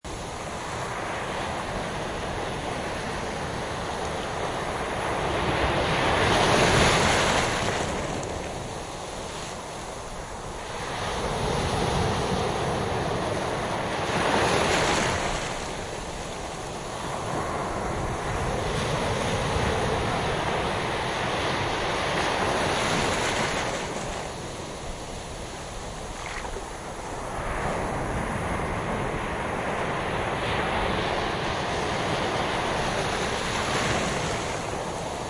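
waves crash surf bright detail beach close up loop bahamas; Sea & Waves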